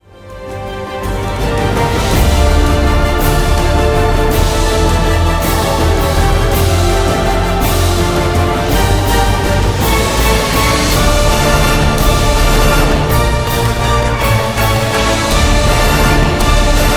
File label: Epic Cataclysmic, scoring; Scoring Reel